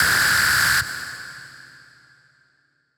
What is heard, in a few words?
Techno Synth Shot G; Synth One-Shots; Ghosthack; 45 Free Techno Synth Shots 2025